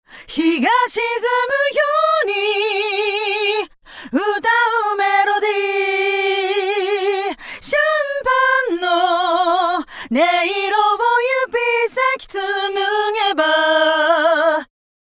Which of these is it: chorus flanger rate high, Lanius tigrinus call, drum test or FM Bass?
chorus flanger rate high